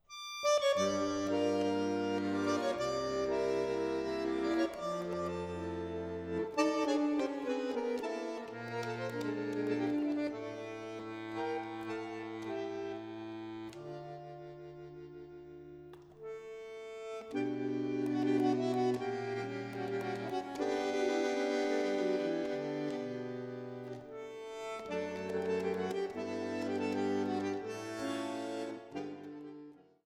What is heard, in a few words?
Bandoneon